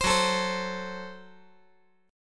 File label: F sharp Diminished; F#dim strummed